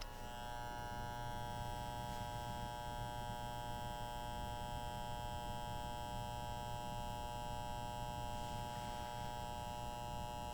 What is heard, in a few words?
Noisy Electronic Hum Light